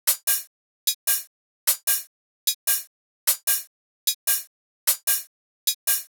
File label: Dubstep Drum Loop Cymbals, 150bpm; Full Drum Loops (Stem Seperated); Ghosthack; Dubstep Sound Arsenal